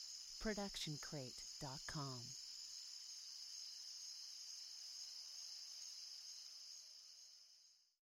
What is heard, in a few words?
cricket ambience; soundscrate